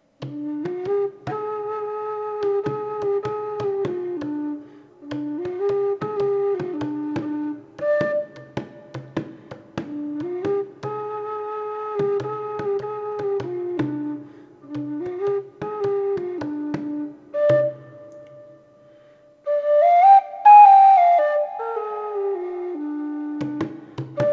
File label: jazz whistle